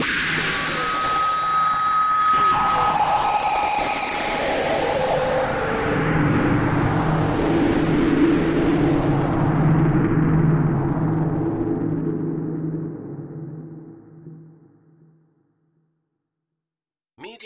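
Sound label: Production Element Distortion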